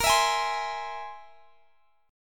C Augmented 7th Bb

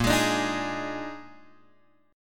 A sharp 11th; A#11 Chord {6 X 6 7 4 4} strummed

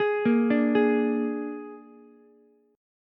Ab Suspended 2nd Flat 5th Ab down Ukulele; arpeggiated; JGuitar

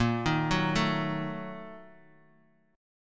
A sharp Major 7th Suspended 4th Sharp 5th

